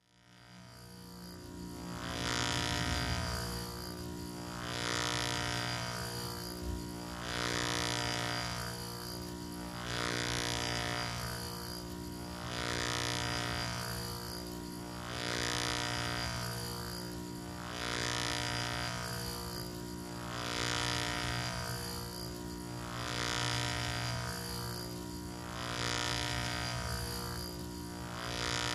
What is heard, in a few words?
Small Electric Motor